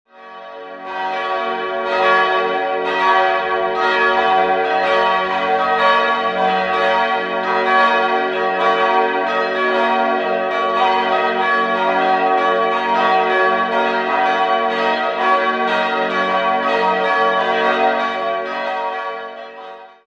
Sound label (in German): Soemmerda, glocken